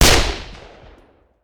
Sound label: Machine Gun; Sound Ideas; Guns